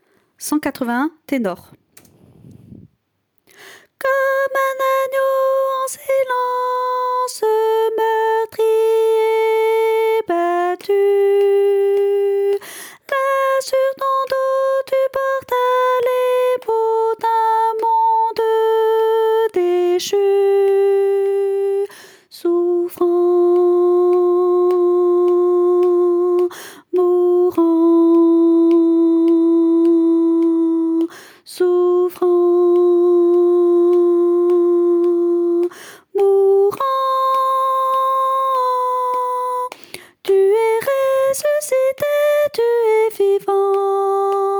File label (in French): ténor